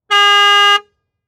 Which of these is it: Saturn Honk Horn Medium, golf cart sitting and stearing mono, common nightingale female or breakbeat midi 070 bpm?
Saturn Honk Horn Medium